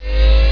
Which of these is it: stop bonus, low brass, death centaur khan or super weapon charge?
super weapon charge